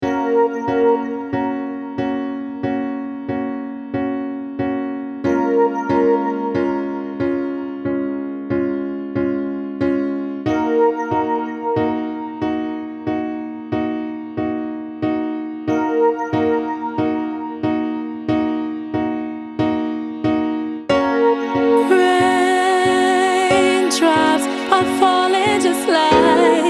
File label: Raindrops